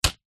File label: warfare slingshot fire